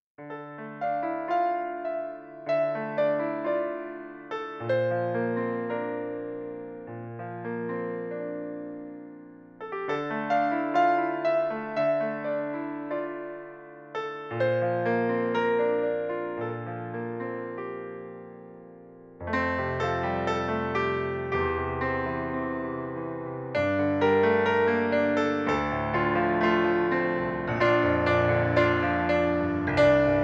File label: Epic dramatic